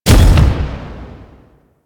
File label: Proj Explosion; Army; Warframe; Hoplite